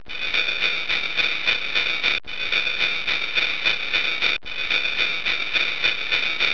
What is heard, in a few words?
sleigh bells